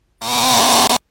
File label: auuuuuuu